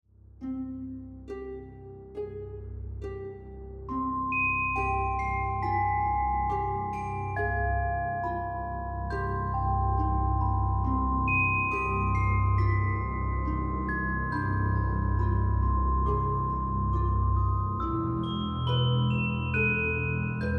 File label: BAROQUE INVESTIGATION; ALBOOM; HYBRID BAROQUE